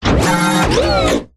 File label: science fiction robot large mechanical movement